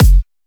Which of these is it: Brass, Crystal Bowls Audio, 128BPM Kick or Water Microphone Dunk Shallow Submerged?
128BPM Kick